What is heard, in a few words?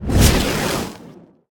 activate; smite; god sol